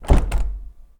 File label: door wood medium closed; DeadFright